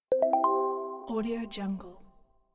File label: correct answer